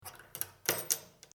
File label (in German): WC Tuer Haken